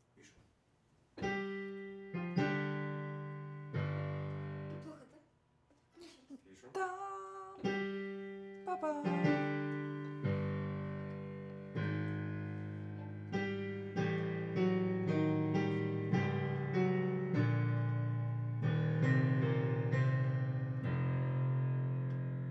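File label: Viola C minor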